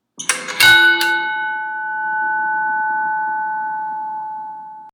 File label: roundhouse bell